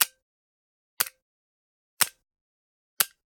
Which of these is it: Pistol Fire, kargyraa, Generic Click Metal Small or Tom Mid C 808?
Generic Click Metal Small